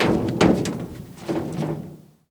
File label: Metal Climb On Dumpster; Foley - Rattles